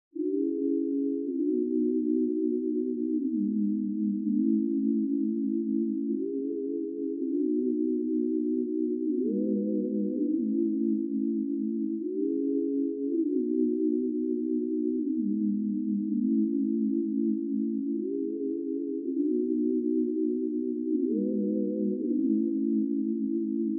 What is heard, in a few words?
162 BPM E Maj Pad; Loop Stems; Cymatics; Miami Guitar Pack - Pt 1; GPS